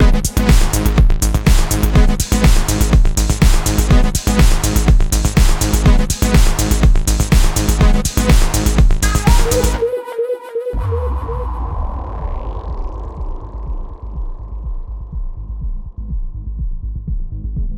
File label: Hands Up